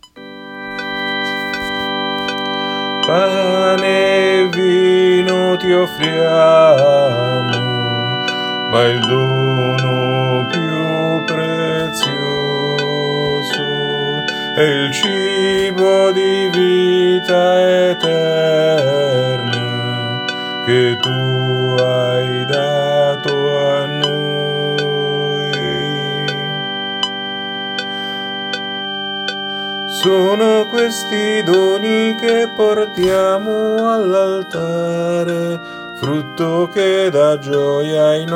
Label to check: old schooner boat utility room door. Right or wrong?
Wrong